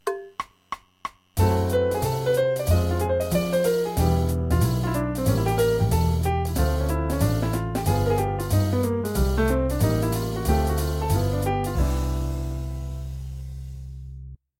IVm7b5 IVm, jazz piano

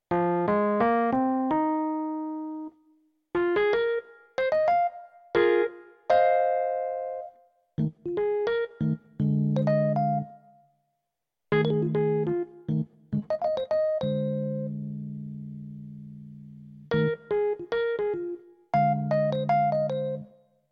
penta, jazz piano

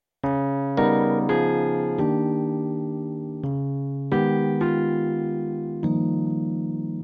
subV7 resolve, jazz piano

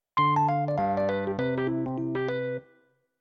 jazz piano

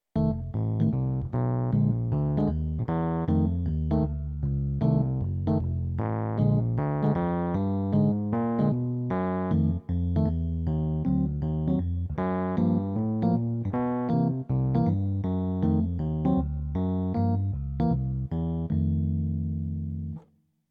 chord

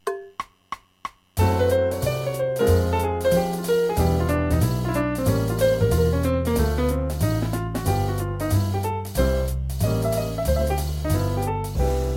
IVm7b5 IVm, jazz piano

